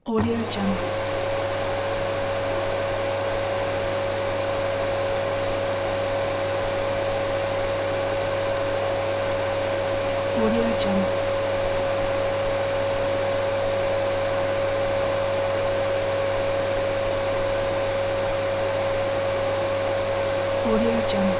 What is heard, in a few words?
vacuum cleaner